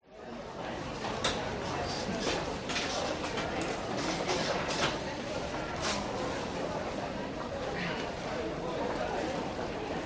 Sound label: Store Department; Audio Hero